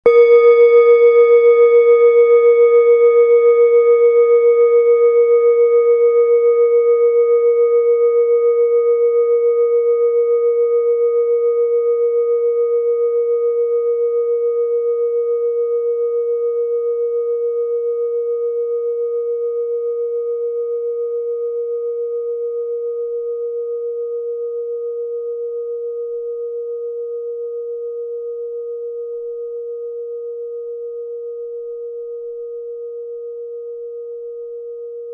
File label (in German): klangschale alpaka